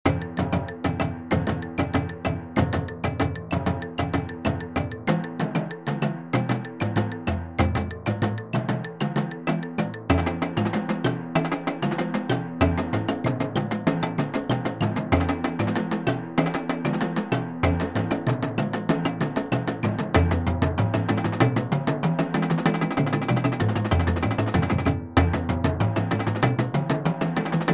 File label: Bomba, marching percussion